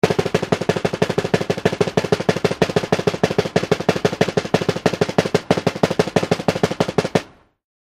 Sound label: Single Snare Drum Rol, drums civil war